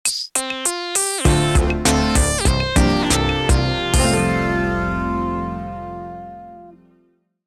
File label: Vibrant 80s Logos Vintage Logo with Percussion and Synth; Folia Soundstudio